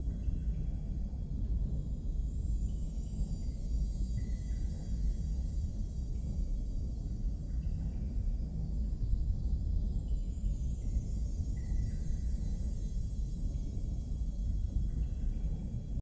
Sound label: cold ambient; CrossCode